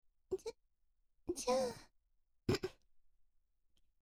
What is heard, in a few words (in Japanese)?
咳払い; NG集; とろあま双子ナースの包茎回診～あま～い双子ナースに、入院生活で欲求不満の包茎チ〇ポをとろっとろにしてもらいました～